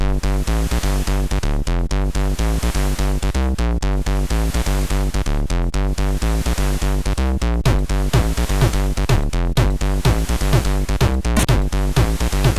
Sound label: Acid, SID MOS6581R2